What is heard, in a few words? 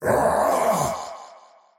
attack big; dota; faceless void